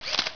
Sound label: scroll; Macworld (1998-04); Paper Air Force LE